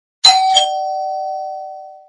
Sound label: Chime bell, Alert Tones; Pantech P2020-Ease